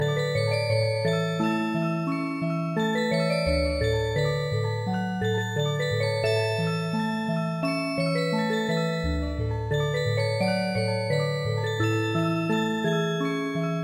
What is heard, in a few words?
soft alarm